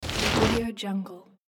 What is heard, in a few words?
moving object